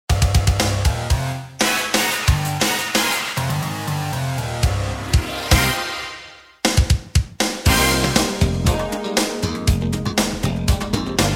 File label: brass minus